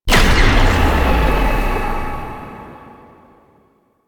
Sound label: Apparition Spectral Impact; Warframe; Warframes; Dagath